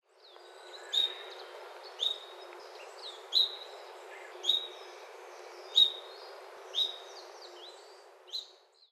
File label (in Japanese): sendai